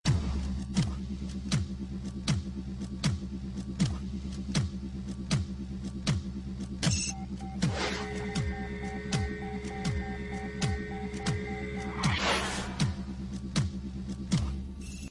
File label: thumping long; robocop